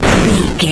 retro explosion general

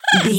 goblin fairy effort jump action

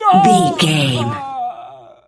voice male b death low

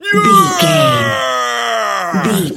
voice male c battle shout

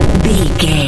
retro explosion deep

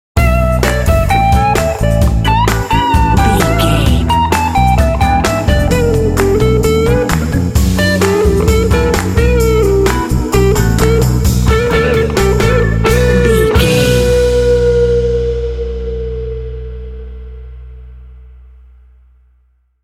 cray blues rock 15 sting

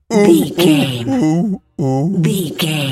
seal walrus death slow

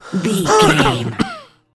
voice male coughing

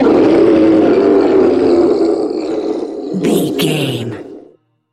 troll monster death low

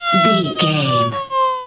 comedy siren whistle fun sounds

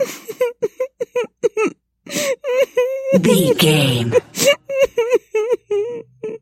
voice female cry

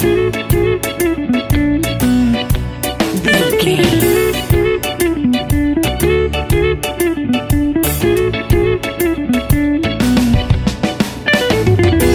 reggae music; Welcome to the home and house and reggae music.

